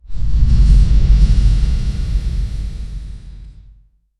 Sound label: fantasy magical flames raging